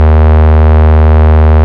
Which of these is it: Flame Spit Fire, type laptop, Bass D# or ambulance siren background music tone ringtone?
Bass D#